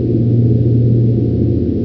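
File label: engine room; Terra; TERACIDE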